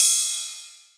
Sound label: Ride; neuro; Sinistarr; Drums